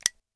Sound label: m9 safety, weapons